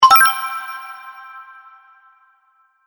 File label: Magic Menu Click